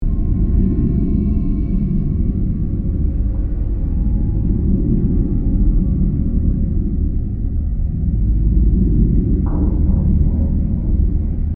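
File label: Ambience Tech Bowels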